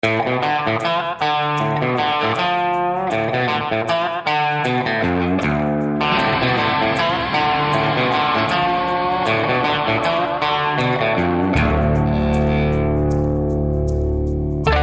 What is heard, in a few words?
country track